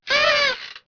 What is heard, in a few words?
aahh